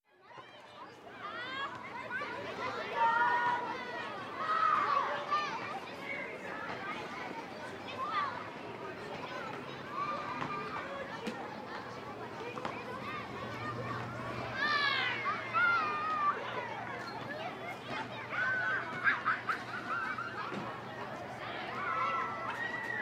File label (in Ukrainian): dytyachyj majdanchyk v parku shevchenka v kyyevi